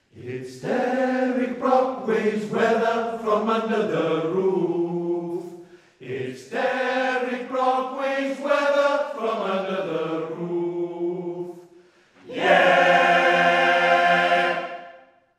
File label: RADIO WALES JINGLE WEATHER; BBC; DEREK BROCKWAY